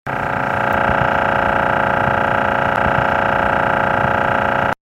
fan sounds, humming noise in your samsung refrigerator